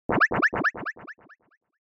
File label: Motion Pop